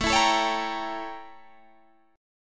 A 7th Flat 9th Guitar Standard; A7b9 strummed; JGuitar

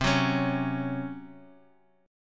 C sharp Major 7th Suspended 4th C

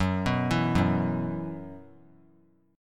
F sharp 7th Suspended 4th; F#7sus4 arpeggiated

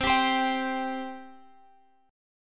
C 5th C; C5 Chord {X 15 17 17 X 15} strummed

